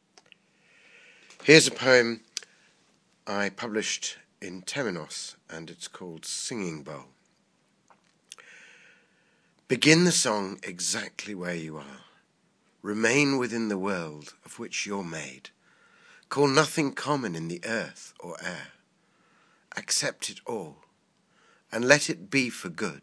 singing bowl; a sonnet

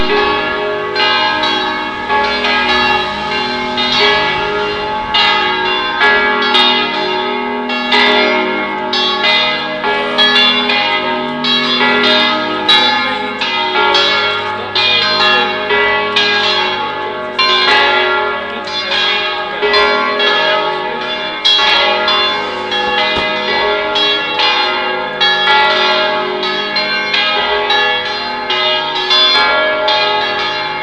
metro cathedral bells; liverpool

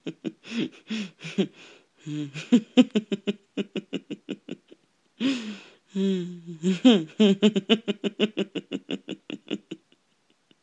giggles

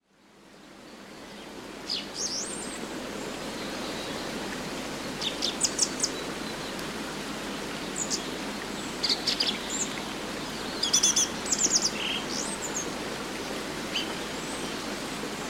song thrush singing in the rain

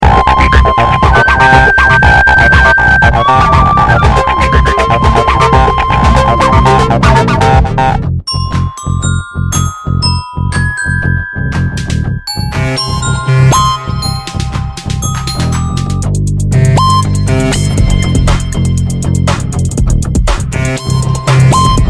blues duet

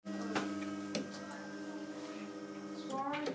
door

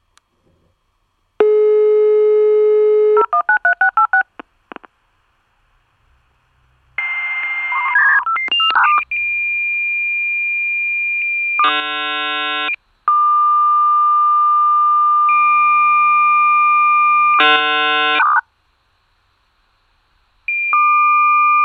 modem dialup

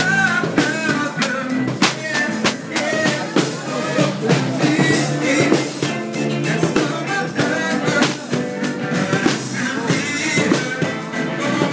streetmusic band

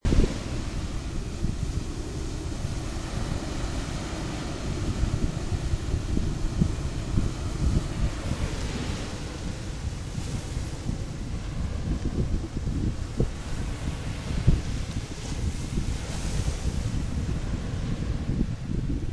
sounds of miami beach

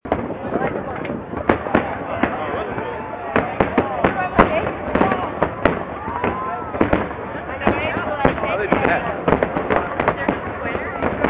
fireworks hudson river; macy s

